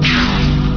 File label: boxes left; india